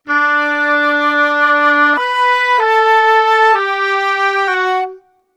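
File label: 95 woodwinds mix, Orchestral arrangements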